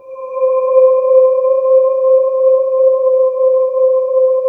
C4, Choir Acoustic & Synth; USB Soundscan vol.28 - Choir Acoustic & Synth; AKAI

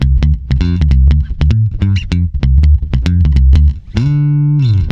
D, swing mute, bass; Spectrasonics; Bass Legends

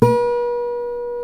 C 5 MARTIN 6, Guitars

